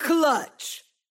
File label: clutch; valorant